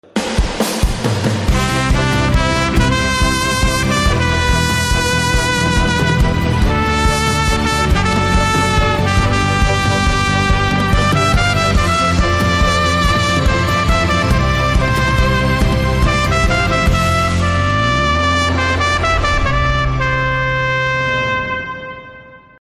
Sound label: BG Saxophone